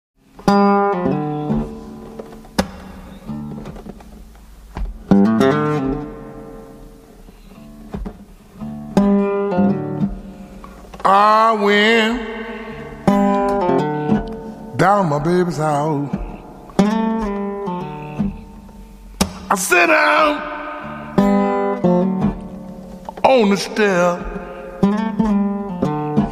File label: Blues